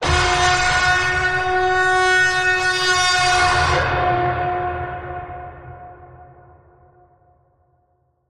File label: Bend, F